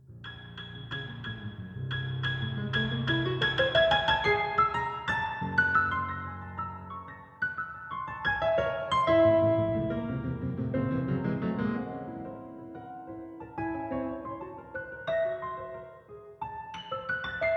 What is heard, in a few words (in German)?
Schoene Klaviermusik